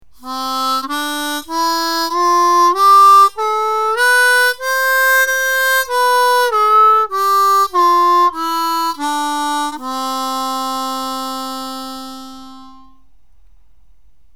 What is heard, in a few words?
major scale; MANJIシリーズ; 「ファ」も「ラ」も出すことができる